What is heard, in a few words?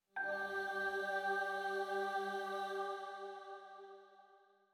Cave Music, Energy Melody Note G3